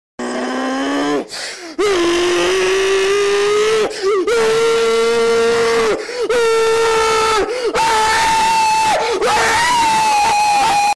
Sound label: car impression; tyler1s